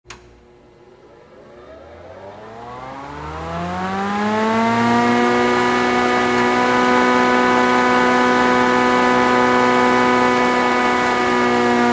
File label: Electric Saw Startin, Electric Saw; Cuts Through Metal. Medium Perspective.